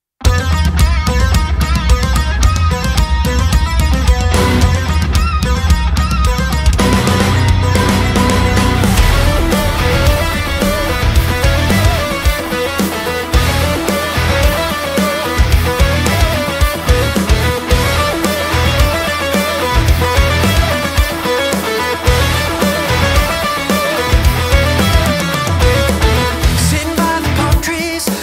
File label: metal cover